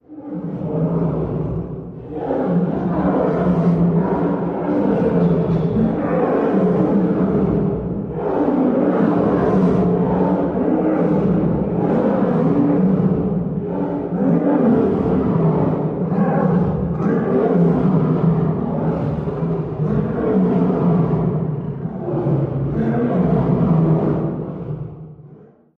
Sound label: Tiger House At Zoo Tiger; big cats